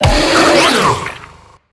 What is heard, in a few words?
Infested Lich Drillbit Pain